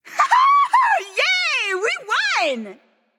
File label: nv yay; smite; awilix